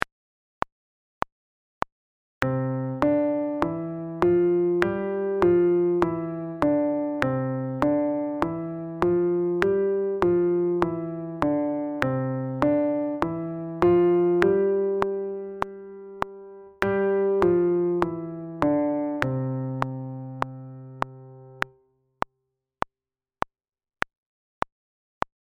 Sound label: 5 Finger Exercise